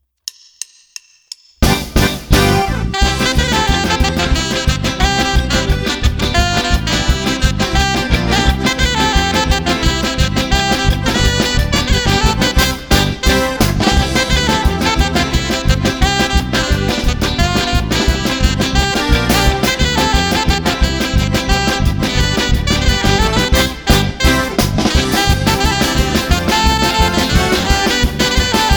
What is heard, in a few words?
Polka